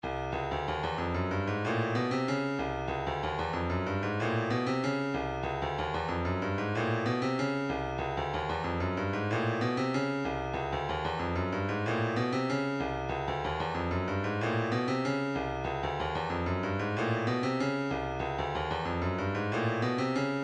vocal planet elastic tuba, midi

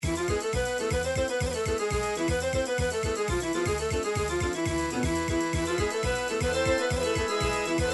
hora gankino horo, folk bulgaria

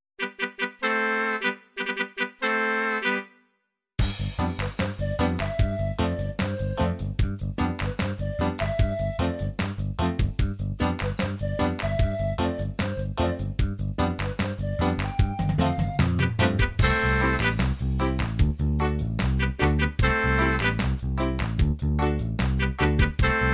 reggae, midi; song book

